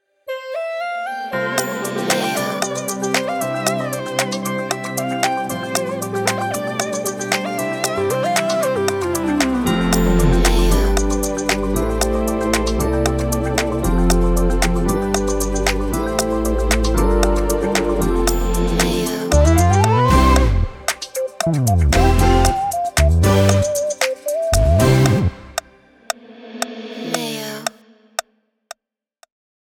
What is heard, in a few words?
hosanna drumless track